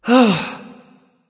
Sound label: nv sigh; smite; voicepack; xbalanque